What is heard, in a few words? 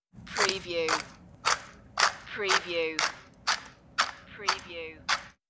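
Pepper Grinder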